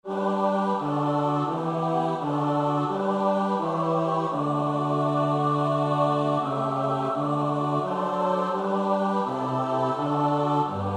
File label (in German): Jesu, deine Passion (S-A-T-B); Wolf (Ernst Wilhelm); Jesu deime Passion